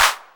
clean hand clap a sharp key, Royality free clap single shot tuned to the A# note. Loudest frequency: 3178H; single hits one shots